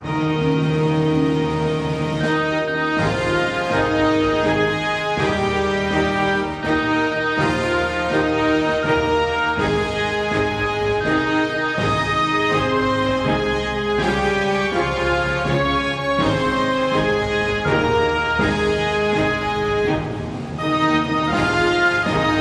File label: Orchestra Happy Birthday